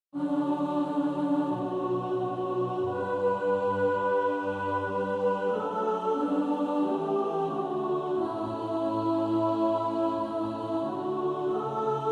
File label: mixed